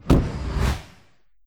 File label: teammate drop; cstrike; pubg